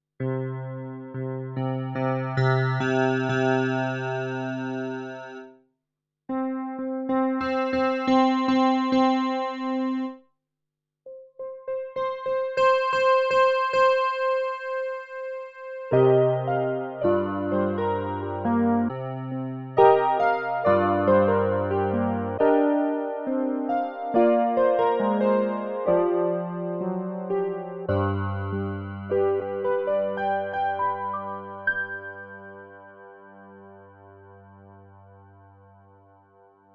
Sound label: K150 TOUCH PNO